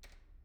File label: pendrop; IEEE AASP